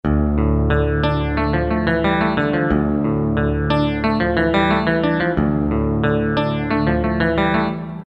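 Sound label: guitar Clean String, E-mu PK-6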